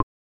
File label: Selection Sound